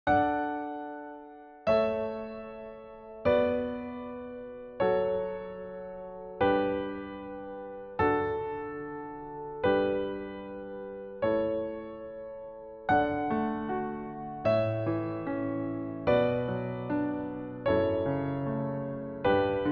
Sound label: Canon